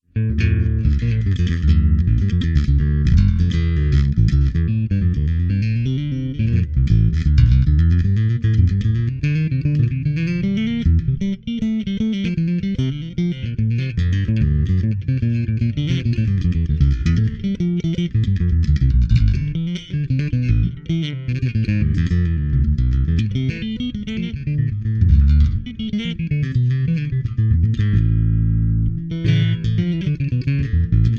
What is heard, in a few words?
courante, bass